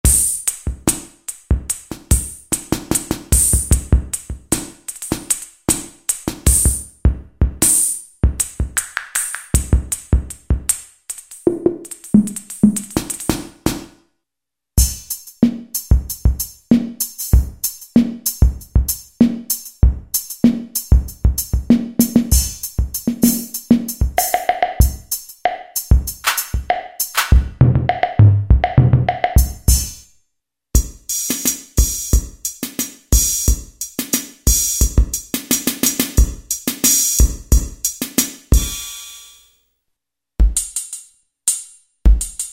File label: ANALOG; Best Service Gigapack